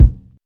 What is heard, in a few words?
rich lows bass drum one shot a key, kick drum one shot tuned to the A; kick drum samples